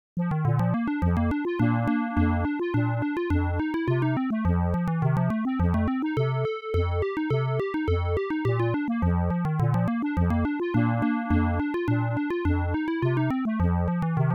polka; Multimedia Toolkit

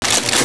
machine; Wired for Sound Pro CD; Aristosoft, Inc.

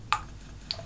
DRIP

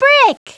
brick; Treasure Galaxy (1994); Learning Company

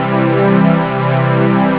low, synth chords

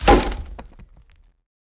arrow wood

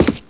step, footstep, pave; SINDEMO

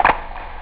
snap; drums

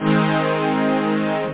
nuke, synth; da capo vol1; instruments

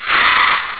Doom 2 Explosion

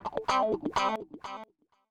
ELECGUIT, HOUSE, 125, A; eJay; Guitar

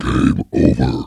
game over; Panic Volcano

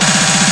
noises, cartoon sfx

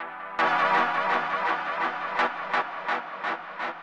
SYNTH PAD HOUSE 125 A; eJay; Sphere

